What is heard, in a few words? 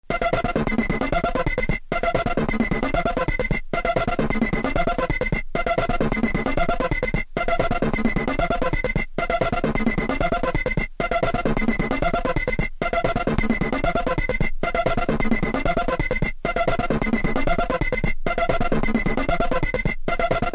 locked groove